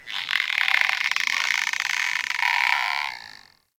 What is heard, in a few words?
Beach Tree Creak; Warframe; Grineer Beach